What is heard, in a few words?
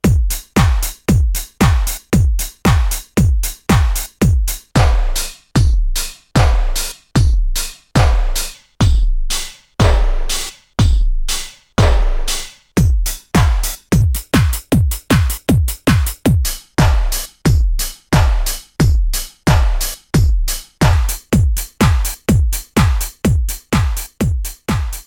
Drumloop Tap Tempo